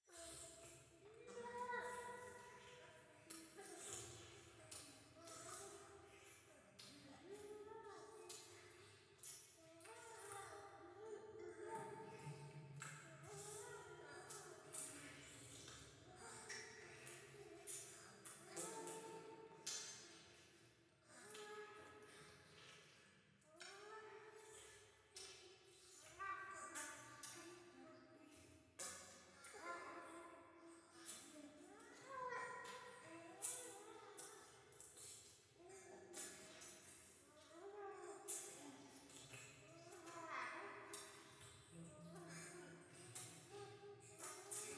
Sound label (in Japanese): 風呂 イラマチオ, ASMR